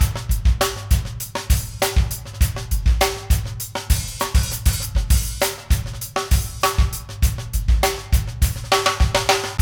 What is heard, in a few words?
funky drums; AMG; Now CD-ROM (Roland); NOW from HELL!